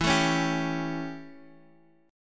A 7th E, chord

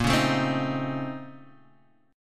Bb Minor Major 11th, Guitar, Standard; strummed; chord shape; 6,4,7,5,4,x

